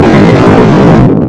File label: slow motion bullet